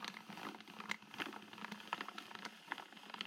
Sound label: Music Box Foley Crank Loop